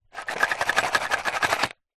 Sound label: household jewelry and small makeup items shake in wood container